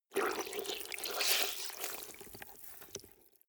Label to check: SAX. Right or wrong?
Wrong